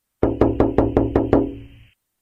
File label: Tap